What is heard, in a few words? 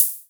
Hi Hats Open; LIMAT Div Dept